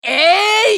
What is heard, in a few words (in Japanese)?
ファイター男 攻撃